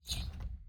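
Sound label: environment building sold whoosh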